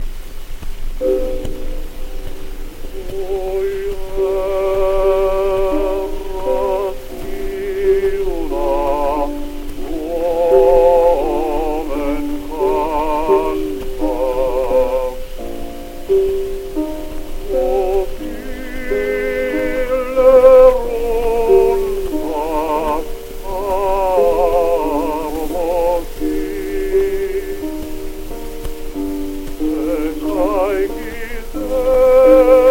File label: Gramophone